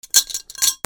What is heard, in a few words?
BANGS